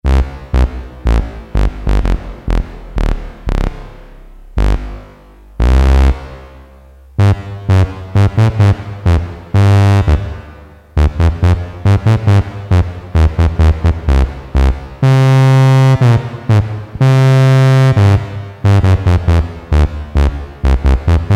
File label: Tuba, Farfisa Syntorchestra, Mono